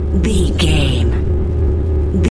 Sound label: energy force spell loop